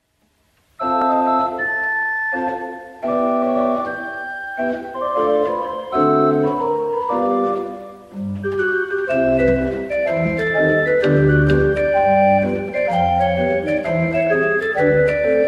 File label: Jazz Pop